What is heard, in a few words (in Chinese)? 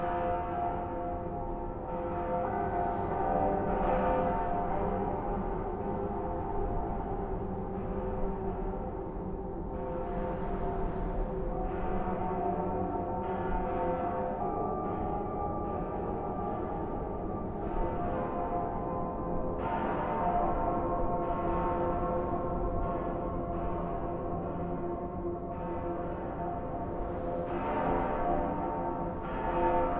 神王殿音效; 2021西路背景音效